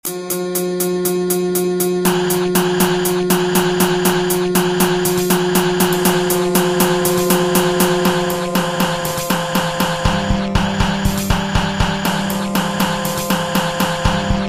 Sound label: Horror music